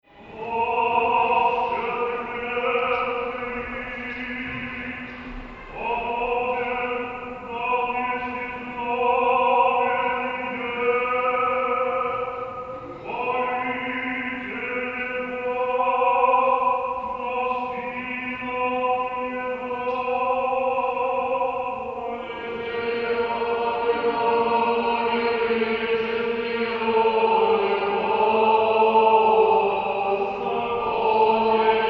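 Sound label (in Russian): Стихера на хвалитех Сретению. Рогожский хор; Закон иже в Писании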